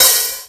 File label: dampened open hat b key, open high-hat tuned to the B note